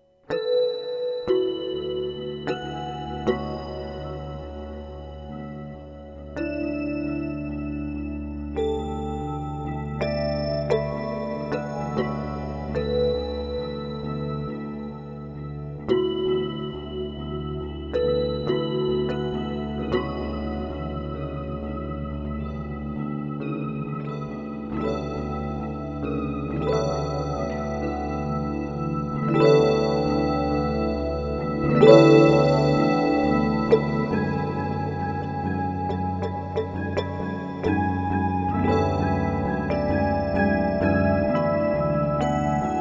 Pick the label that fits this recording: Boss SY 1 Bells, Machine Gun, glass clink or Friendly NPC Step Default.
Boss SY 1 Bells